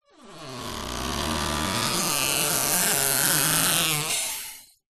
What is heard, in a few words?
office plastic squeak stretch adhesive tape